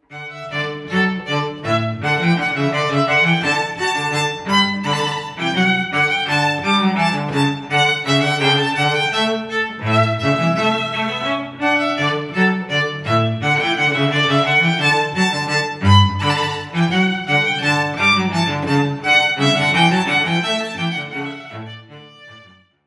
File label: duo rondeau